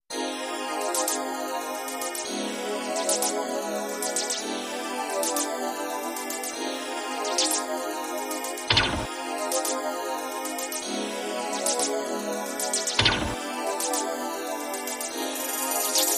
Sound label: Acid Big Beats